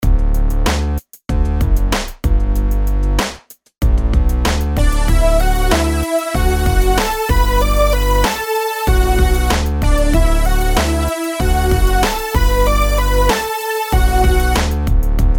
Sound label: rhythms simple 8s major